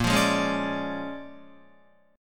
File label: Bb 7th Sharp 9th Bb Guitar, chord; Bb7#9; strummed; JGuitar